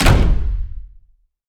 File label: door; Geometry Dash